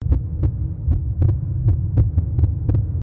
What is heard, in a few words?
Spooky Heart Beats